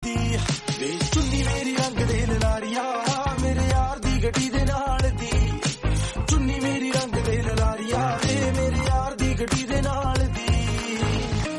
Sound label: punjabi song